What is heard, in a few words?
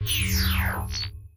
message